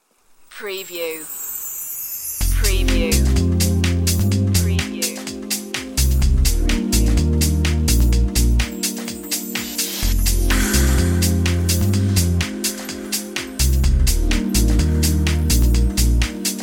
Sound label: summer holiday with waves fx